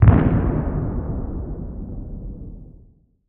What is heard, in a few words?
death; rund um die welten